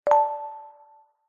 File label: multimedia game sound chime mallet warm family game